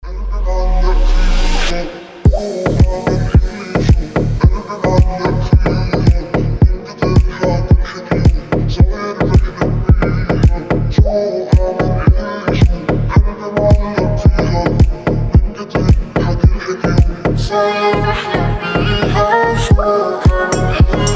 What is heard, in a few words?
arab remix